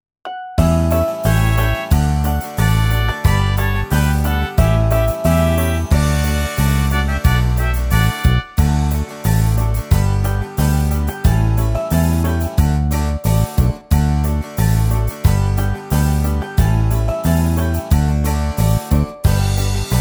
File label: Karaoke